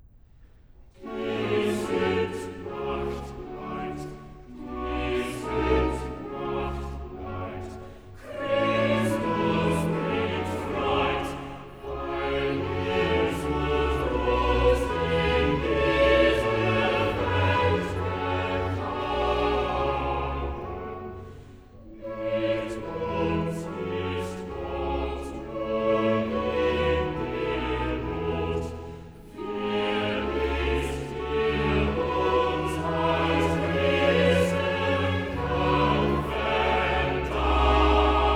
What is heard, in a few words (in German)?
Choral; Cantata, BWV 40 'Dazu ist erschienen der Sohn Gottes'; III. Choral 'Die Sünd macht Leid'; Bach; New York; Xmas Day + 2nd Day of Xmas